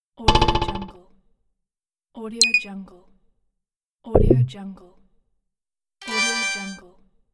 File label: multimedia ui notices